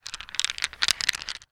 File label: stones pebbles handle; foley; zapsplat